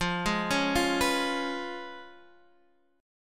C sharp 7th F, chord

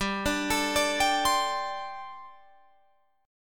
G Suspended 2nd Suspended 4th G, Guitar, Drop D

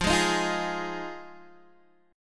F Minor 7th F Guitar DADGAD

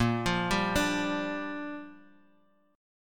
G Minor 7th A sharp

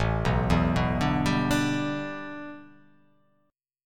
A 7th Suspended 2nd Suspended 4th; chord

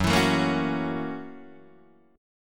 Gb 7th Sharp 9th Gb Guitar Open D

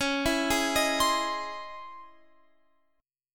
C sharp Minor Major 9th C sharp, chord

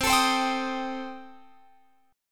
C Minor 6th Guitar Open F, chord; Cm6 strummed

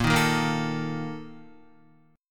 Bb Major 7th Suspended 4th, Guitar; strummed; JGuitar

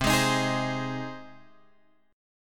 C Minor Sharp 5th C Guitar Drop D

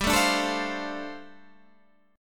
D-Minor 7th G, chord

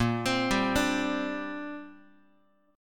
A sharp 6th Add 9th, Guitar; A#6add9 arpeggiated; JGuitar

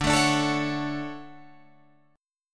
D Major 7th, Guitar, Drop D

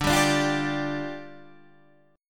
D Minor Double Flat 5th, D, down, Guitar DADGAD

